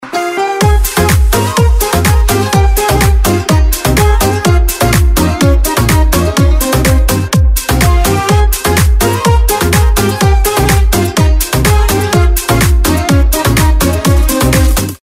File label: Techno ringtone